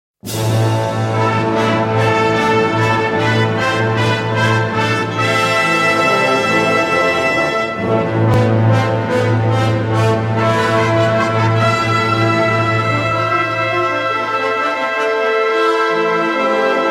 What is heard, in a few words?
Three Kings Swing